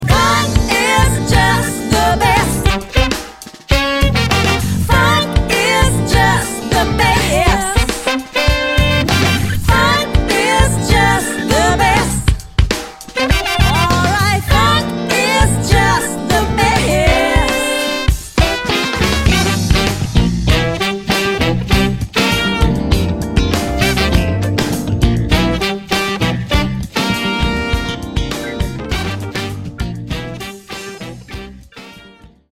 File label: funk